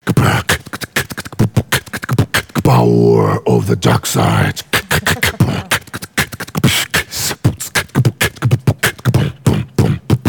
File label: Beatbox